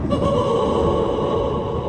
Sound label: Laugh; Pennywise